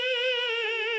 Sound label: Vibrato C2